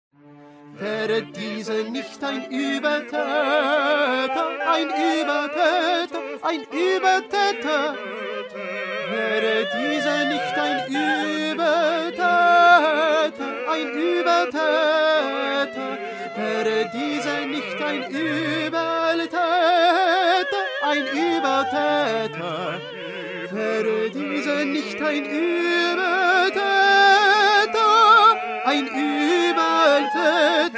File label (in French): petit choeur tenors